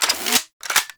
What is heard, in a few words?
Arch Dual Pistol Equip; Warframe; Archwing